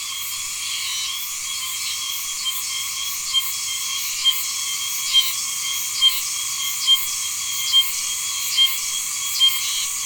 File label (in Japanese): ohshimazemi